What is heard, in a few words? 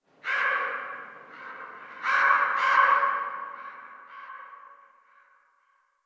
Tree Distant Critters Scared, Ambience; Warframe; Duviri